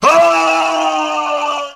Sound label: scream